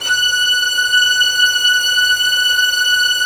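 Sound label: violin section, Arco Violins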